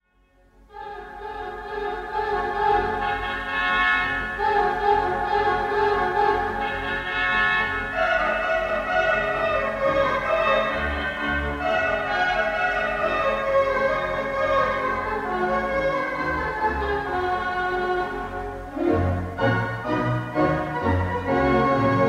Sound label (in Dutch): Concertorgel; Czard.fürstin; BBC